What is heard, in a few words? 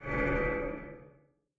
Ship Turbulence